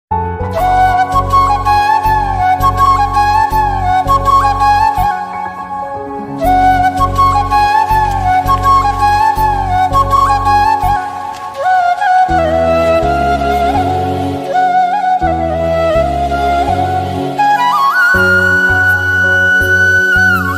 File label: mohanlal malayalam romantic ringtone; evergreen; thalavattam